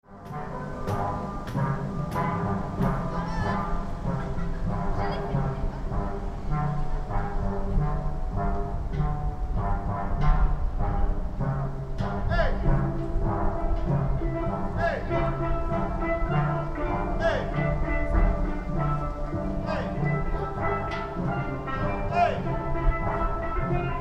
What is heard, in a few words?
school steel band; avignon